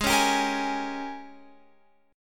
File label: G sharp Major 7th Suspended 2nd Suspended 4th, Guitar, Open F; strummed; x,15,13,14,13,15; G#M7sus2sus4